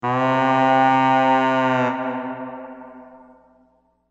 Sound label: SMS ringtone; VIKINGS